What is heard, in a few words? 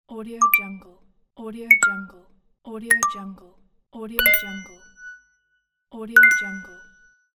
buttons